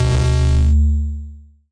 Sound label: nasty stormfront; Nasty Stormfront Electro FX